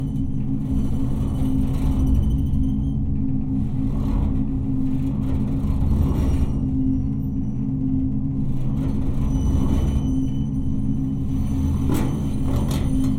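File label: ambient hvac conduit loop; ambient air; Half Life Alyx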